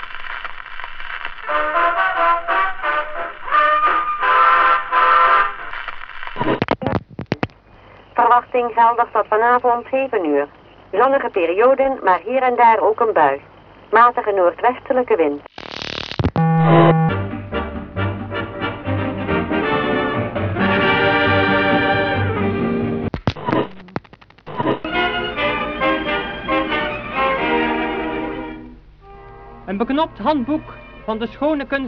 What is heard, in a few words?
glider radio, ambience; dod